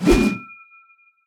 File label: swing; smite; god sobek